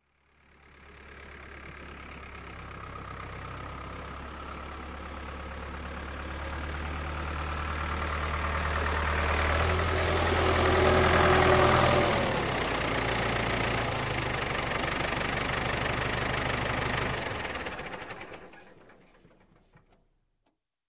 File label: Tractor, Diesel Pull Up, Shut Off, Industry; Farm; Sound Ideas 2000 Series